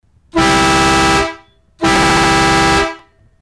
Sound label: horn